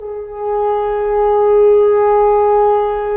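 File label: Solo French Horn, Brass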